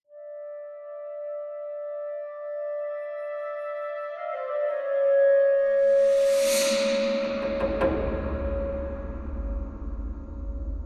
Ambient

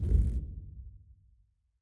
Pulley Lift Move; Mountain SFX; Mech

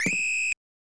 Whistle; Tecmo Super Bowl II Special Edition; SNES

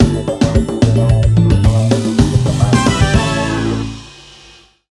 Level complete; Atomic Adam: Episode 1

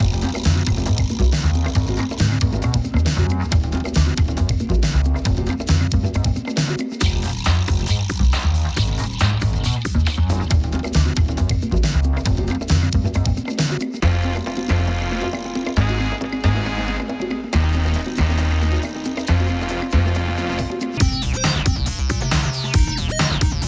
Hip Hop; Talking Roby the Robot

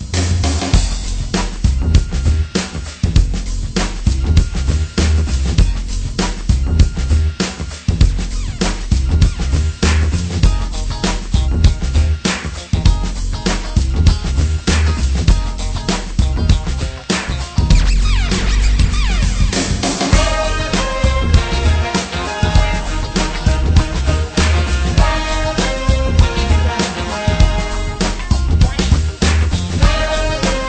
Menu Loop

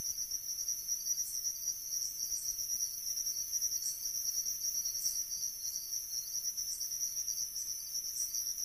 night crickets; gamerip; quivr ps4 windows gamerip 2016

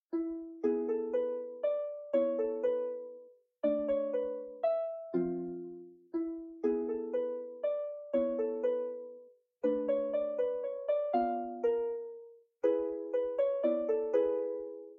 unlisted music track; Sir Brand; RuneScape 3

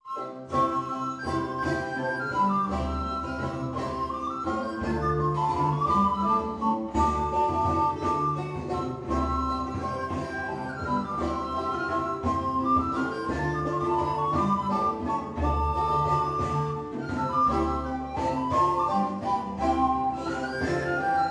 Tavern

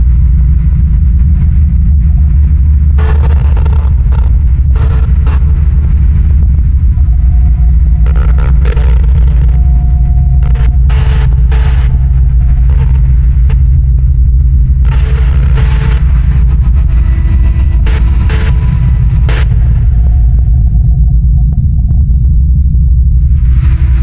Ingame menu Ambient; Hydrophobia

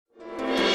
Menu

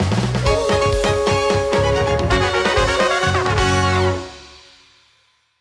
Win Jingle

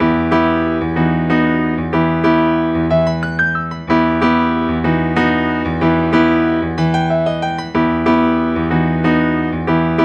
Dragon's Valley (Piano Ver.); CookieRun Sweet Escape Adventure

